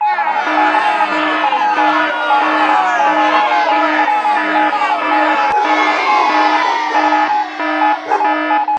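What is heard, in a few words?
alarm sound and crowd of people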